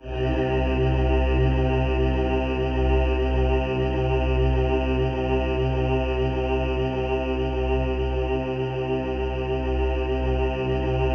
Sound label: C2, Synth Leads